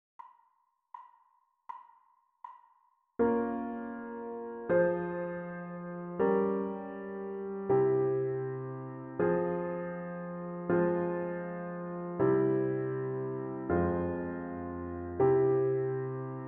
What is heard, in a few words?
harmonic dictation; ear training